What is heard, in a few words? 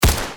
Deagle